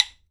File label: KALAAW, HAWAII PERC; AKAI S6000 CD-ROM; WorldSounds